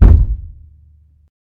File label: thump; Project Zomboid v41.73